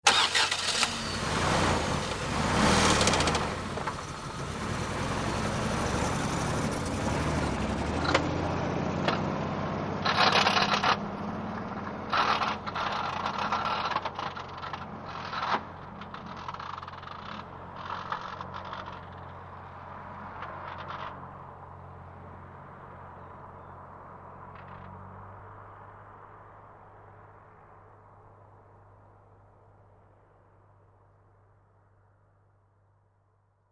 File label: Car; Start, Away Medium Fast With Heavy Rattles